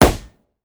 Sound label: balloon pop; ambient; atmosphere